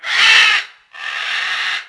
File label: PORCUP; animal; animal fun